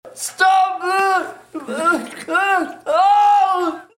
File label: freeze screaming